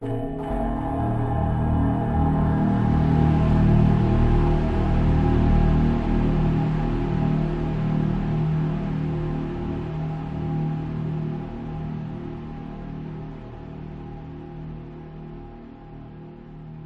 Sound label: horror hit chilling bell chime into drone wind sweep